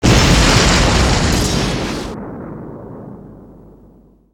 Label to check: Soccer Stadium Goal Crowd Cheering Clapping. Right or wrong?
Wrong